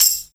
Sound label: Tambourine